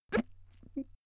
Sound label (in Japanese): 元気な少女, ゴックン